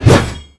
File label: whoosh metal